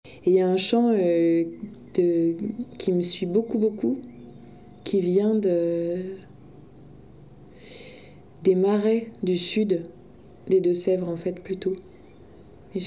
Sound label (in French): chant marais des 2 sevres